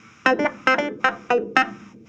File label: Cruisin' Disco Clavinet, 70s Analog Funk